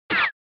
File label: Retro Anime Gun